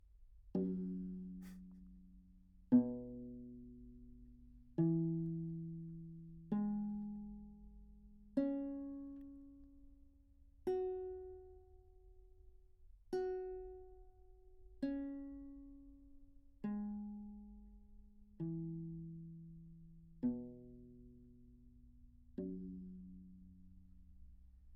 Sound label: tenor viol back microphone pizz, Anechoic; OPENAIR